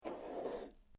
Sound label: Metal Sheet Move; Materials